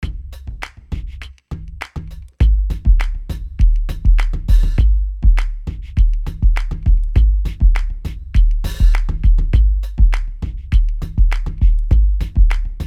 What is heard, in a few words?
seq Sub Kick; Sub La Kick